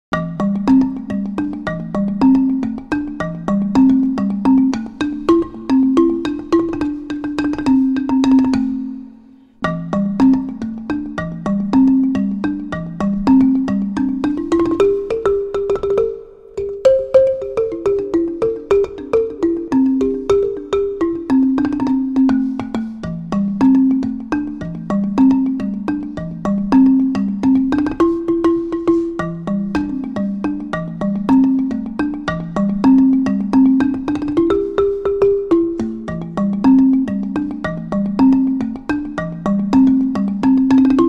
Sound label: Balafon